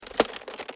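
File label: reload movement; cstrike; valorant; araxys operator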